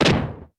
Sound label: explosion; Rescue Team3